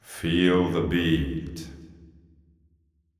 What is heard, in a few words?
deep house male, 125, A, vocals